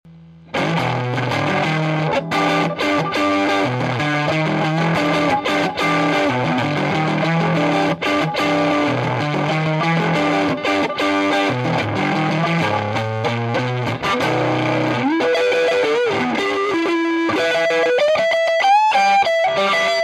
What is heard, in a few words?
strato nom nck